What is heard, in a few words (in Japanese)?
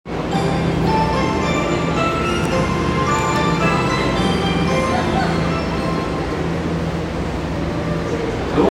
東西線神楽坂西, train sounds